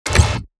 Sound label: Shooter fps game menu ui error